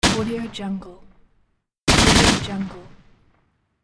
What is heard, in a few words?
ak47 sound